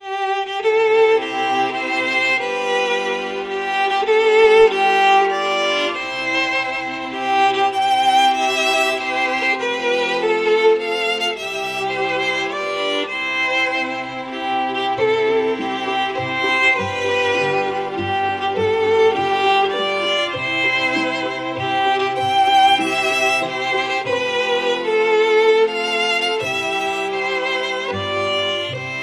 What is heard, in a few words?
String Quartet Happy Birthday